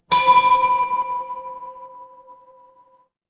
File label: instrument bell